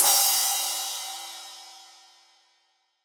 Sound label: Crash; Uppercussion Conundrums